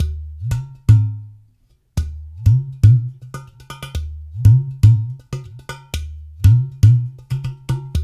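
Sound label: 120 bpm, udu calypso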